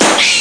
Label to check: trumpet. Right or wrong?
Wrong